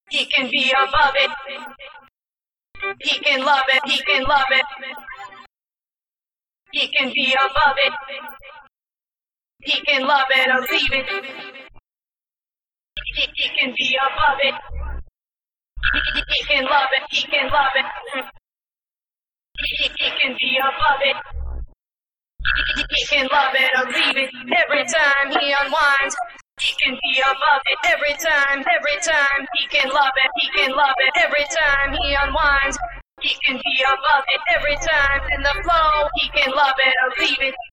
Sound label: Acapella